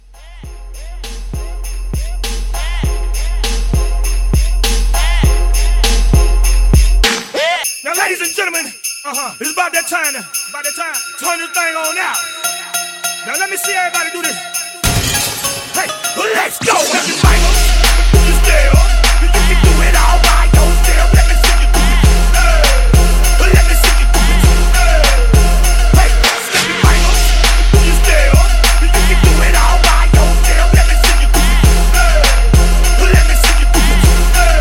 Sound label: Hiphop Christmas Mashup